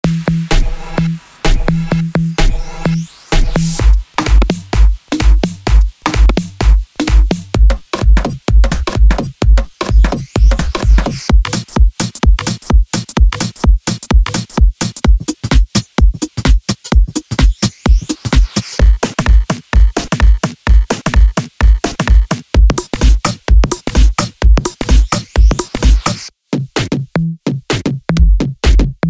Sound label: Progressive House; Essential Progressive House Vol 1